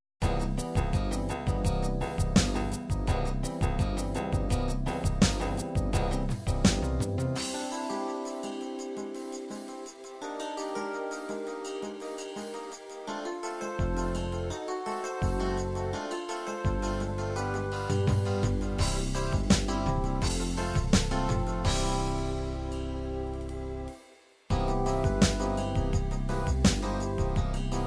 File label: bossa nova, midi